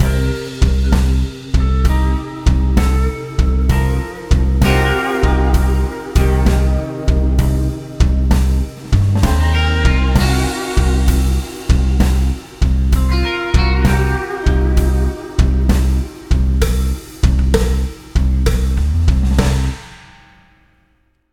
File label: Blues; Distraint